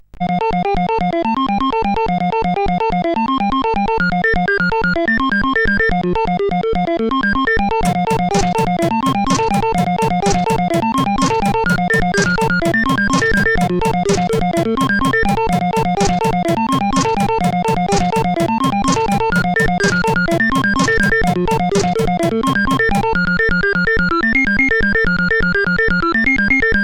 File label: 3 Note, CSG8580R5; IMAP